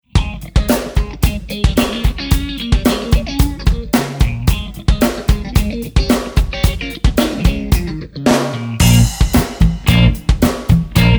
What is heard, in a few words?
funky